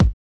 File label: Kick, techno drum